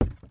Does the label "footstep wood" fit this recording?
Yes